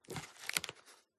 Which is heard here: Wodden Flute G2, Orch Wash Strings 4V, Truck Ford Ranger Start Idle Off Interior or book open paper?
book open paper